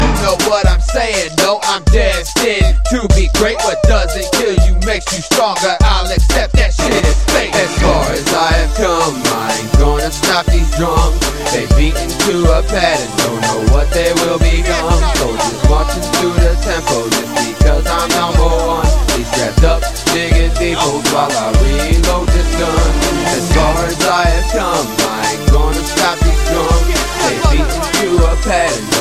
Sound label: Beating Pattern